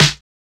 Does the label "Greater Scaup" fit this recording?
No